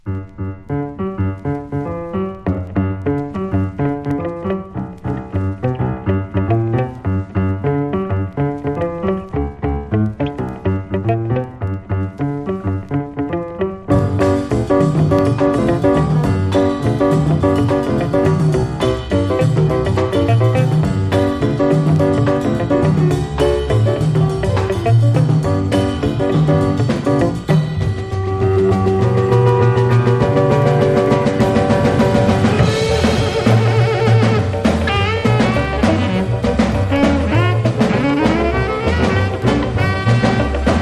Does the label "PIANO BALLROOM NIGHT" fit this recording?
Yes